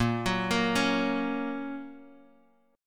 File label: Bb Minor Sharp 5th, Guitar, Open D